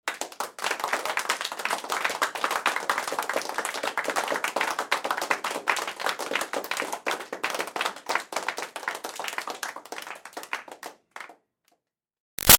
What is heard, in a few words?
Crowd Small Applause Med; Interior; Applause (Sound Effects:Human); Big Room Sound